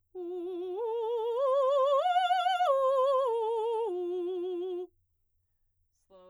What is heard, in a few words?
arpeggios f slow forte u, vocalset